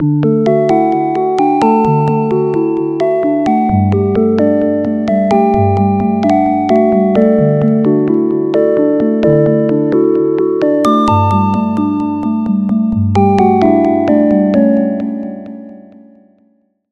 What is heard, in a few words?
Key, Sylenth1